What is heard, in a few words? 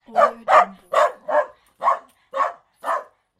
dog bark